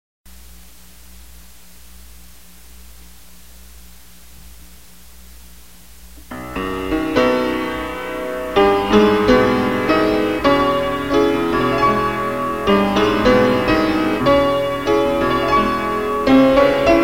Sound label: PIANO